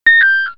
Warning

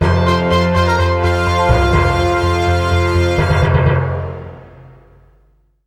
Robot Victory; Cubix Robots for Everyone Showdown; gamecube

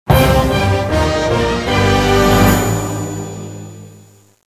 Fanfare; Strong Monster; Yu-Gi-Oh! World Championship 2007; DS

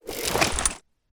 COLTS DRAW IN

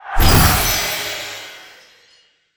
SFX spell harness magic

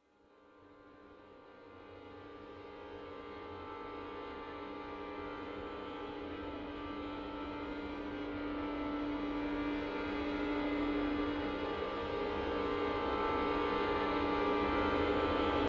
ambient suspens; Necrovision; ambient #2

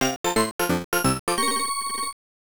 Lose (Miss, Hit Pig, Hit Pufferfish)

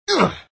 Collision; Sonic.EXE

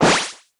Powerup; Kill Pill; Windows

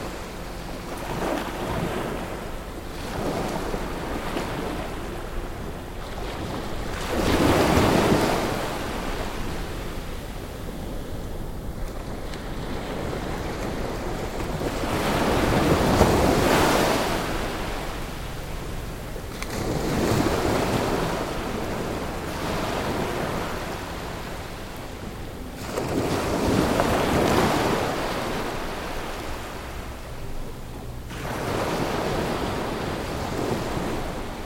Calm Beach; hiberworld android ios online windows gamerip 2019

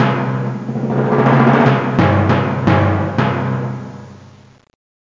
Timpani; kentei kyoukai imasara hito ni wa kikenai otona no joushiki ryoku training ds

